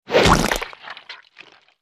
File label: impact splat; BRYTLJUD; FUKTIGA